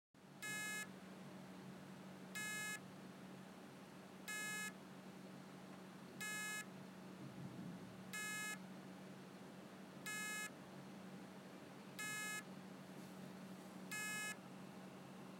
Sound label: hdd recovery beep